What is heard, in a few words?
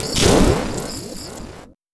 Metal